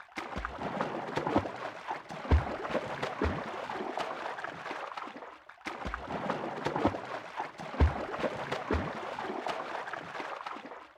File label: underwater swimming; RadwinIsland